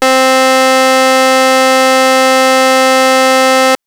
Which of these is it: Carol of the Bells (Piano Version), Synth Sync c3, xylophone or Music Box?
Synth Sync c3